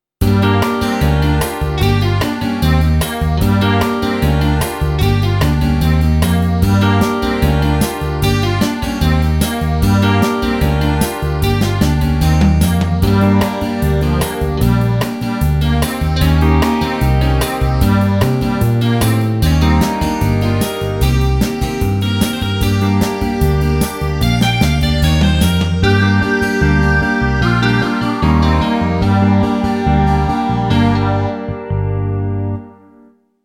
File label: Blues Rock in Gm Fast Country whistle 150